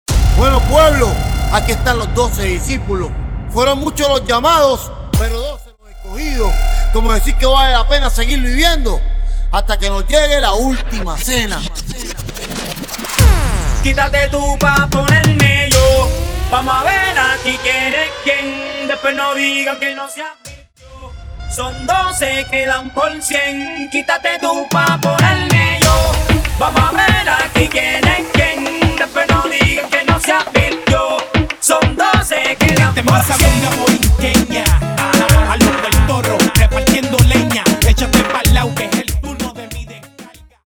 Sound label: Open Show 95 BPM